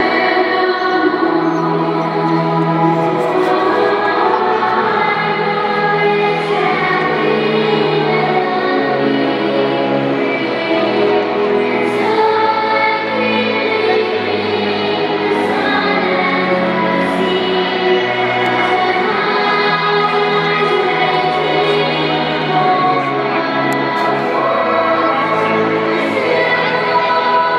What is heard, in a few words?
choir perform; the qantas; ausvarg